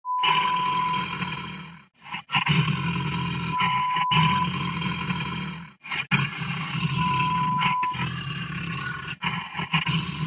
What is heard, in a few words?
Big Cat snarling